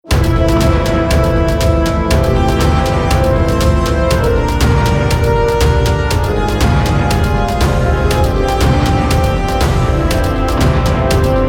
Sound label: orch